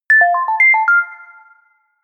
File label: feedback positive jingle